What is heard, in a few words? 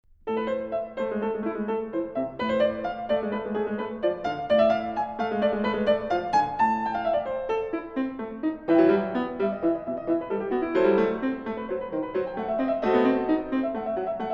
Prelude in A minor, The Well-Tempered Clavier; BWV 865; The Well-Tempered Clavier, Book 1; Angela Hewitt